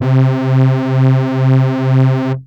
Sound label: Techno leads, hits and riffs